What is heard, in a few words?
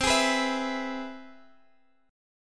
C Augmented Major 7th, Guitar Half Step Down, chord